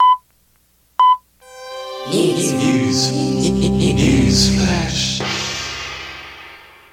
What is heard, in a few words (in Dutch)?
Begintune nieuwsflash met gong; news-bulletin